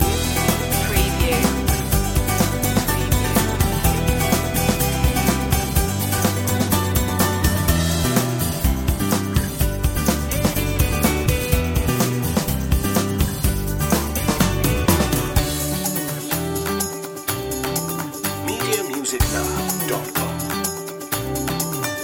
bright journey loop

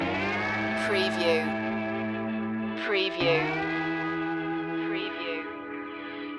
bikers blues loop

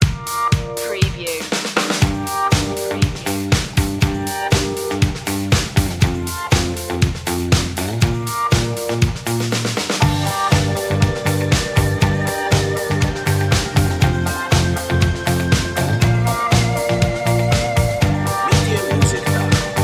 catchy groove

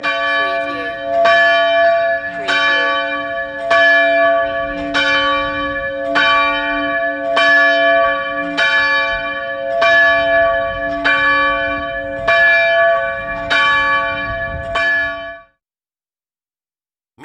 Church Bells French

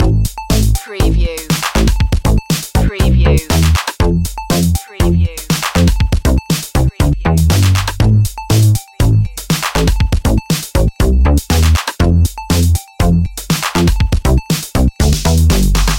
video game fun loop

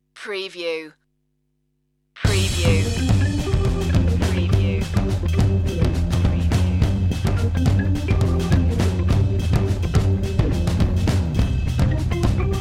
hot mambo

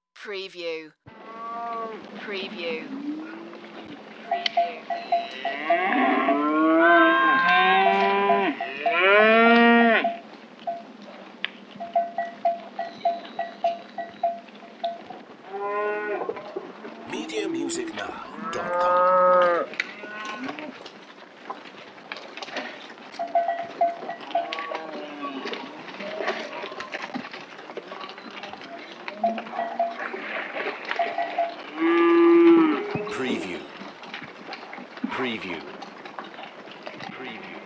Cattle Run

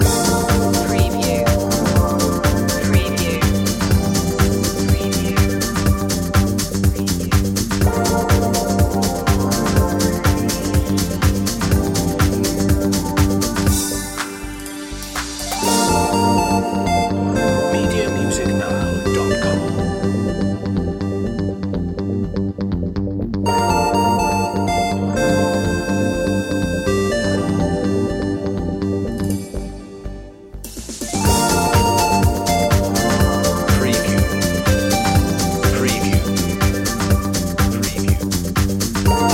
astounding electricity loop

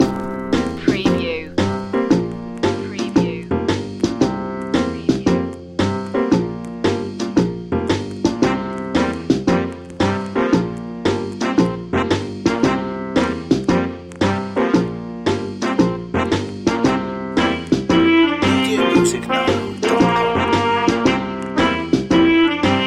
retro theme no vinyl scratch sounds

